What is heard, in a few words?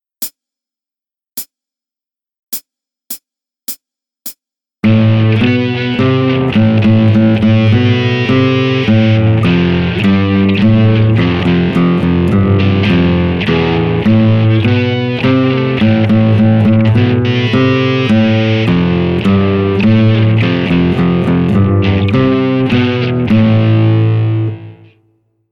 thumb rock